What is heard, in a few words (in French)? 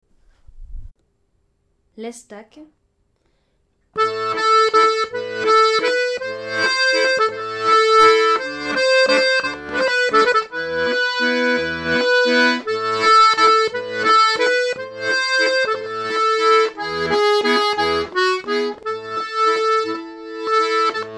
melodie; rencontres-2010